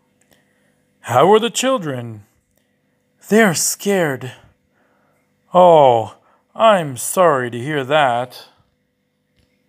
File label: scared children